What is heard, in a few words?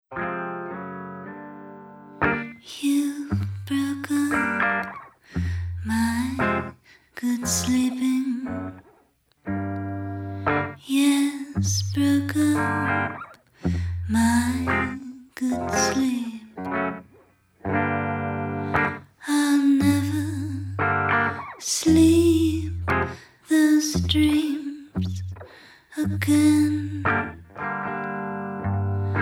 Vibrato; LADY LUCILLE; HOUSE OF THE FUTURE